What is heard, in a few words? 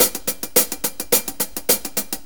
4-4 HiHat Loop; Sonic Foundry; Drum Tools